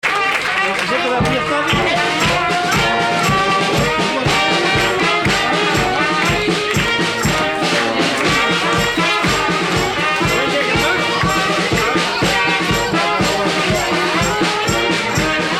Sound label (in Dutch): fanfare